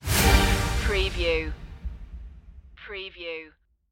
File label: battle music